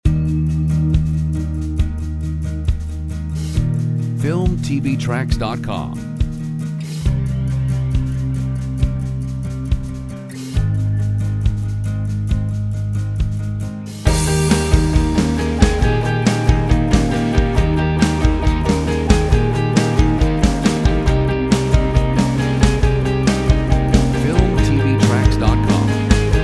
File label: Uplifting Pop